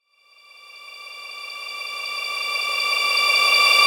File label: Hissing Approach